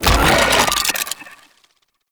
Dragon Scales Activate

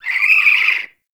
Owl Idle Chirp; Warframe; Duviri; Glyph Puzzle

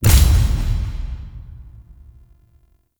Menu Close; UI; Warframe; Tau Mission Menu

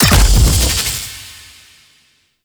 Shield Arm Break Explosion; Warframe; The New War; Sentient Devourer; Snt Orphix

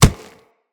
Step Dirt, Footsteps; Kubrow

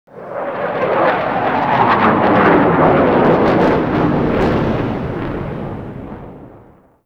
Drop Pods Fly By; Eidolon

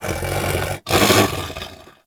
Pet Snore; Enemies; Warframe; Catbrow; PetShip

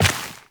Step Dirt, Footsteps, Walk; Warframe; Tenno

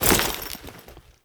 Foley Step; Warframe; Kahl

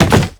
Land Soft Wood, Footsteps; Warframe; Characters; Operator

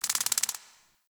Insect; Liset Alchemy Lab

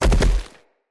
Stag Gallop Vegetation, Footsteps; Animals; Foley

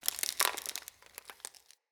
Ext Branch Rustle; Midrath Island

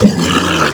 Flying Mouth Pain; Warframe; ManInTheWall

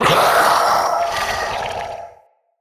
Male Vocal Breath Heavy, Enemy; Mockery; Mockeries

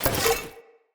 Player Torch Equip; Weapons; Blunt

